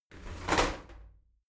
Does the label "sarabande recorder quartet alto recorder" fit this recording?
No